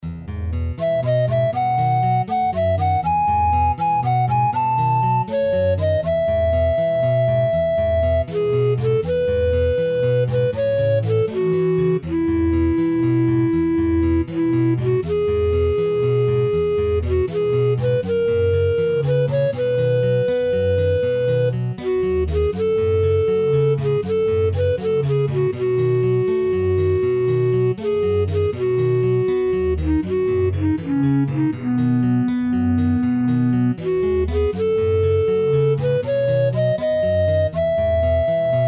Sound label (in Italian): canto di natale, base; san giuseppi; canzoni religiose